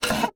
Wood Chair Slide Across Wood Floor Quick, Household